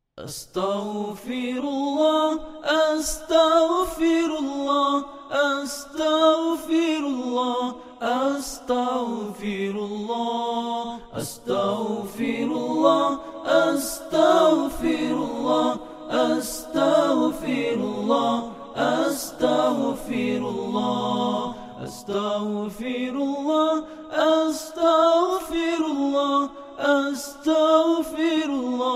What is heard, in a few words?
istighfar